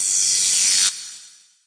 Electro FX; Ueb HE Electro FX

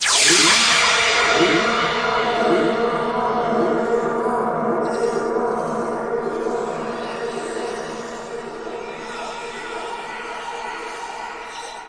long wet space fx; Various Strange Sounds and Effects

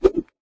kung fu staff, swings

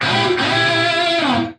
straight up guitar lick

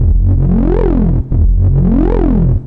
wow bass; BASS LOOPS

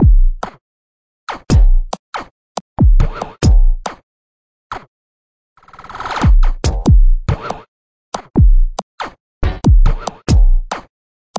crunchy tweaker, drum loops and breaks

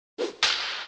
whip

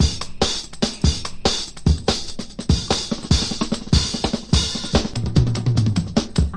146 fat poppadaddy, drum loop; 141 to 160 bpm

